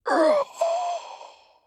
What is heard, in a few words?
Sentry Startle